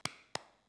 one awkward clap

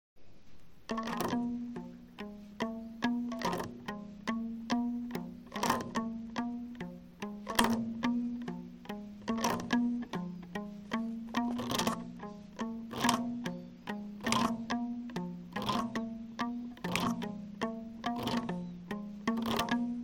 Tupperstring

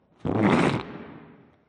Whoopee

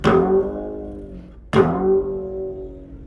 Bass

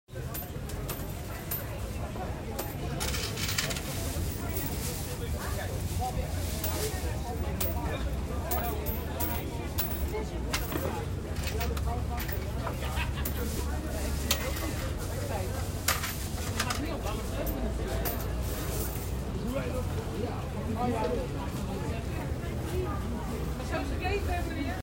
Jingle money; Rotterdam Field Recordings

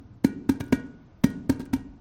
Vase Drum

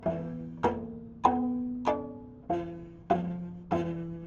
Metal Tupper string

